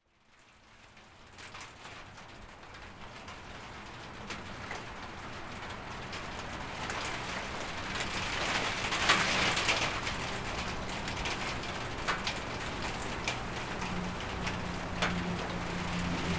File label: rain on carport in morning